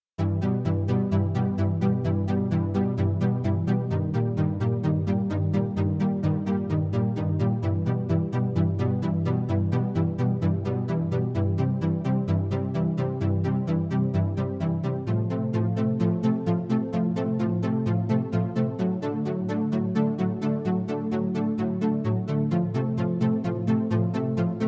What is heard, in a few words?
stem synths